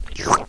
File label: spooky, notes